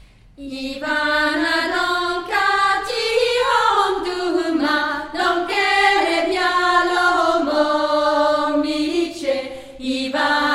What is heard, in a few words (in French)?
Vox Femina Chant bulgare